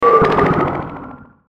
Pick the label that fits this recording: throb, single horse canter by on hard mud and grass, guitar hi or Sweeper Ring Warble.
Sweeper Ring Warble